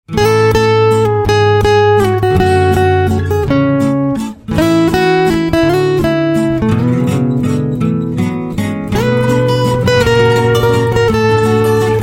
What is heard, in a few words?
Guitar, instrumental ringtones